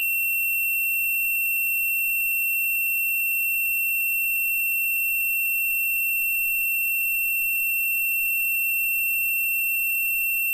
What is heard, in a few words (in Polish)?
Ton